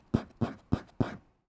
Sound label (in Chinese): 猫挠门